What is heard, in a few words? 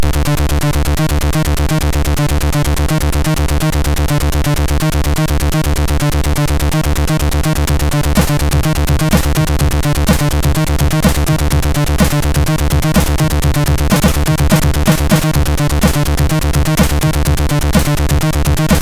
Digi Acid, MOS6581R3